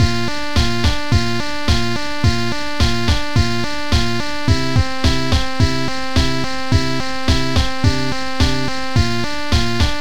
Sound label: Plant (Internal Percussion)